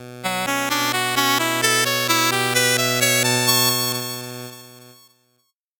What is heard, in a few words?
8bit jingle slow